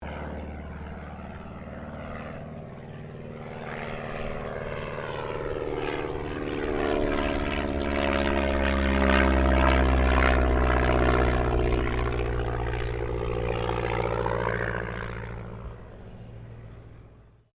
AIRCRAFT DH82A DEHAVILAND TIGERMOTH PASS; RAAF Tigermoth; Airplane Sound Effects; Vehicles

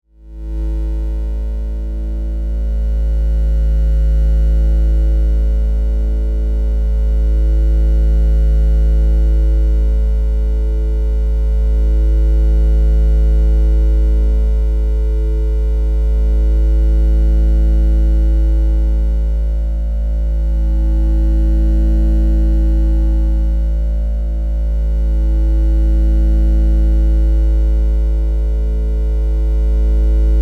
Drone Electrical, Hum, Warble, Flange; Production Element

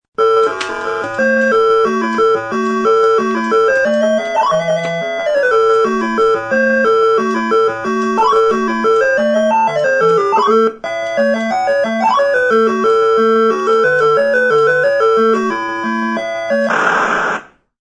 Play Rallyx, Playing Rally X video game.; Games

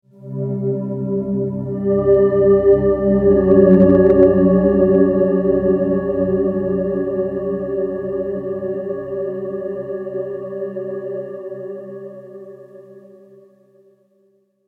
Synth, Swell, Ghostly, Ethereal, Horror; 5.1

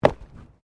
Body movement Organic Impact Medium

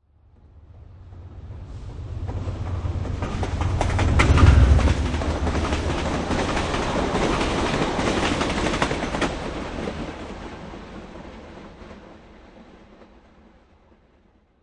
Train Commuter Train Pass By; Passenger train drive by

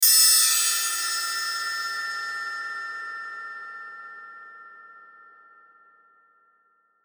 Hit Chimes Reverb; Production Element Imaging Element Accent Transition

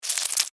Insect, Movement, Fast, Scurry, Bug; Sound Effects:Animals:Insects; Blastwave

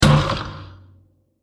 Smashed Plastic; Burst; Impacts; Sound Effects:Impacts; CraftedSignals

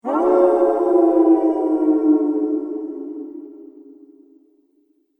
Howling Wolves In Unison; Wolf Sound Effects; animal sound effects

